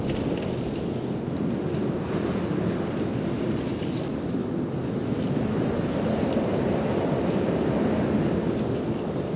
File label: wind low and rustle, ambient